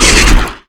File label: Grunt Shake Head; netent